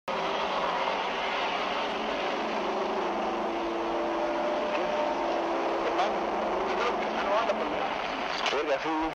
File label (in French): bruits étranges en chemin; Avasko